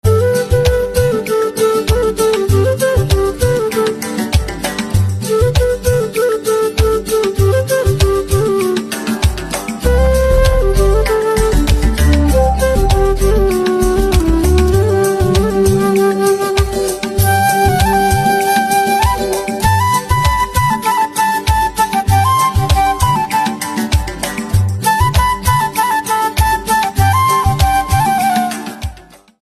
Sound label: Flute Instrumental Ringtone